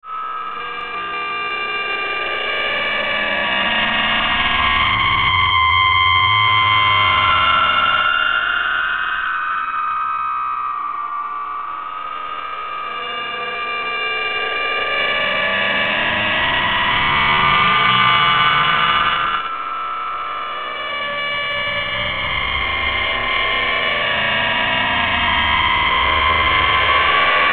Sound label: Feedbacky Drone, Mysterious Feedback Drone; Strange , Edgy Oscillating Feedback Drone , Like A 1960s British Tv Alien Monster; Sound Design